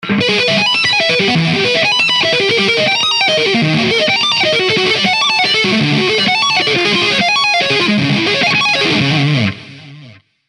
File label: Buzz Solo